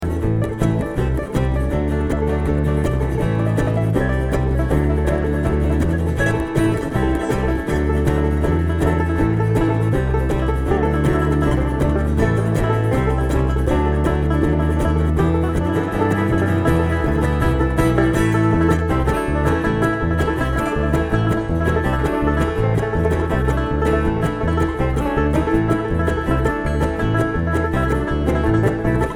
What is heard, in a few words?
Traffic Jam